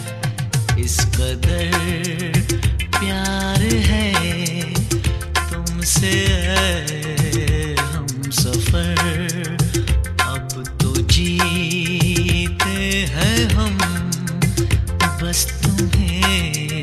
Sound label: Hindi Ringtone